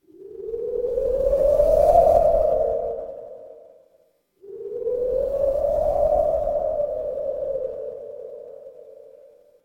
horror wind whisper

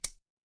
glass glass tap

